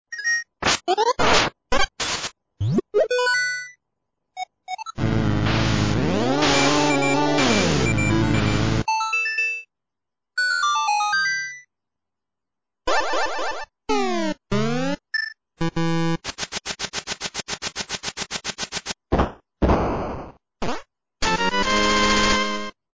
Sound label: sfx unfinished low; Zelda3; lttp